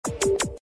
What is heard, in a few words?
Message; Airtel